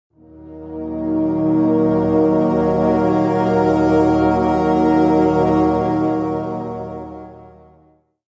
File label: Medieval Foreboding; Civilization Revolution; xbox